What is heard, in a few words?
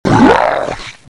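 zombie hurt heavy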